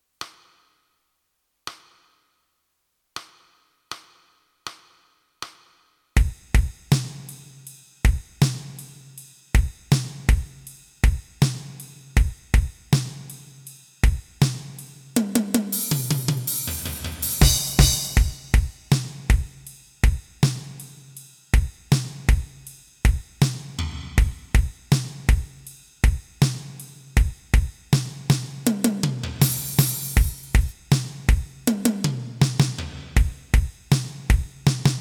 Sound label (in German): rock basic etuede, 80